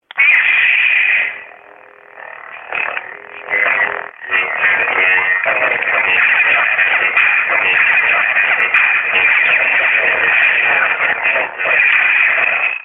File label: Lightsaber